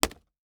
Impact Board Wood Hit By Wood Stick

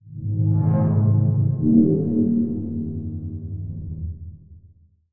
Title Transition Logo; Production Element

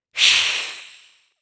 Horror Scary Vampire Hiss Snake Hiss

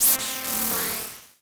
Transition Distortion Electronic; Production Element Title Transition

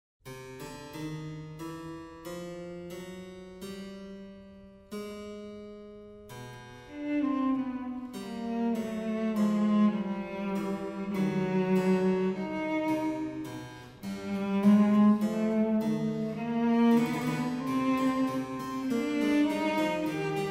Canon a 2; J.S.BACH; The Musical Offering

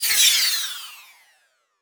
Title Transition Power Down; Production Element; AdobeCC

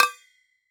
Impact Propane Tank Hit By Large Metal Pole

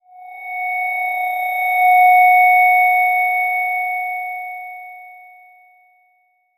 Transition Stab Dark Bell; Production Element; Title Transition; AdobeCC

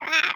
Animal Toad Spadefoot Toad Quack; animals; AdobeCC

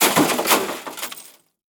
Crash Metal And Glass Debris Falling On Concrete; crashes; AdobeCC

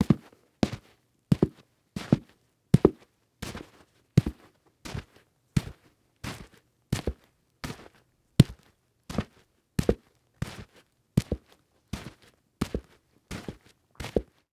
Foley Footstep Cowboy Boots Dirt Debris Waking; Foley Footsteps

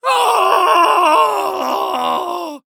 Human Male Troll Scream

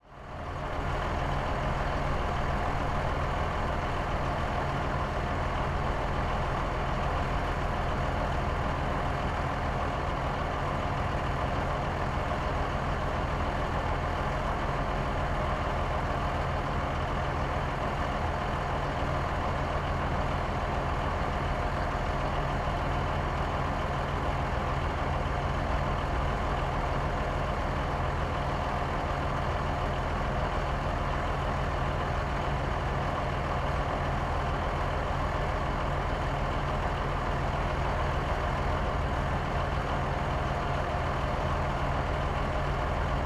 Industry Bulldozer Idling

